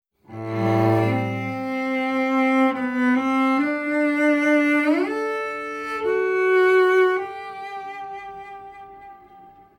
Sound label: Cello